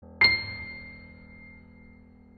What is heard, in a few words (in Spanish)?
sonidos agudos y graves en piano